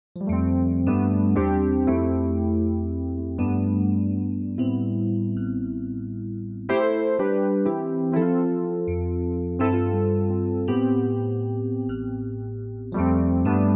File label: Soft E. Piano; VIVO H10